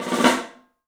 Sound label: MARFLM, 3D Drums, MARCHIN ROL; 3D Sound